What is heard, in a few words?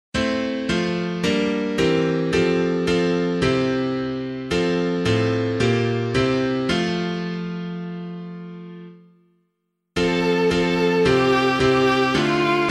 piano; Christ Is Here Emmanuel; Stanbrook Abbey; CASWALL; Friedrich Filitz, 1804-1876